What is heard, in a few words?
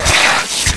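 brain attack; baseq2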